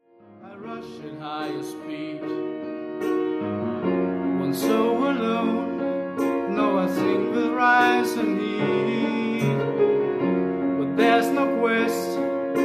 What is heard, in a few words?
Piano Version